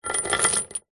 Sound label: chain med sized set down on concrete paving slab; industrial; zapsplat